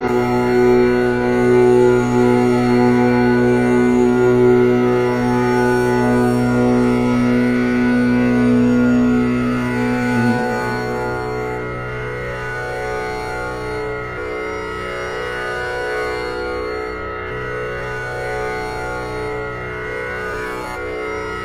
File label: om meditation